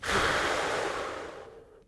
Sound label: breathe OUT; cigarman